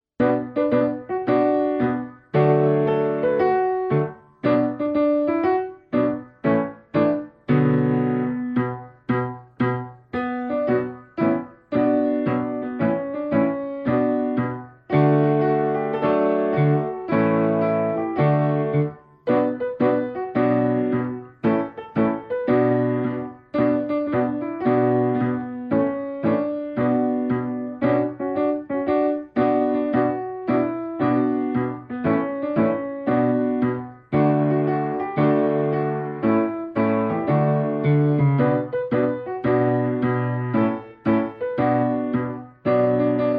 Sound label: instrumental